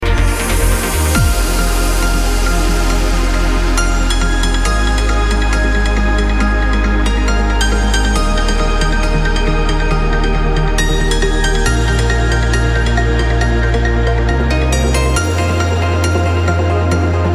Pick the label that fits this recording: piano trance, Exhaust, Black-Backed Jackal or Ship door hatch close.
piano trance